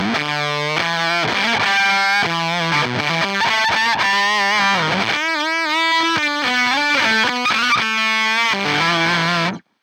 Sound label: fuzz; machinemodeling; ToneX